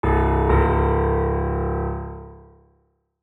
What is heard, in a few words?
Piano